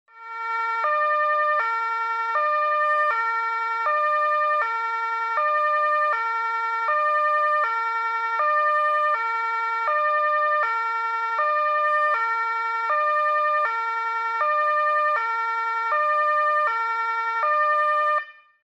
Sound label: police siren